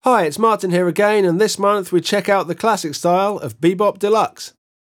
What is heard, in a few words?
Rock intro, Guitar; Guitar Techniques 2019 Issue 290 January